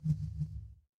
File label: office phone vibrate